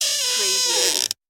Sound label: squeaky hinge